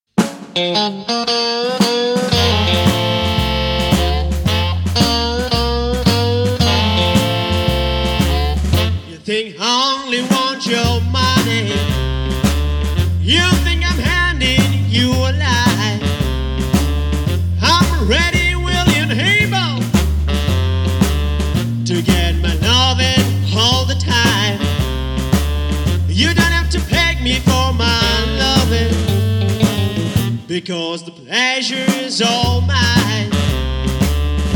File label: BLUES BAND, voice, harp, guitar, bass, sax, drums